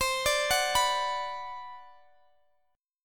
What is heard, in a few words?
B Minor C; Bm/C; arpeggiated